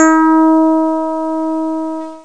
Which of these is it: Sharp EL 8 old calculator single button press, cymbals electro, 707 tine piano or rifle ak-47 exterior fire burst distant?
707 tine piano